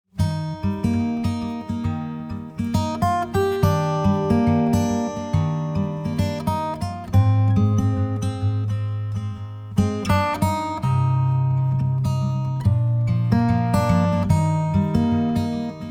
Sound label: a beautiful day positive guitar